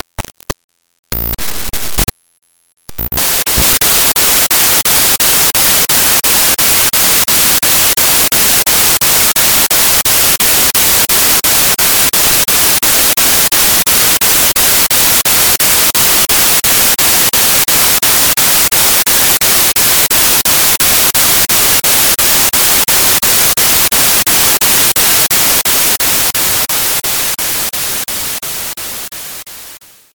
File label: pulse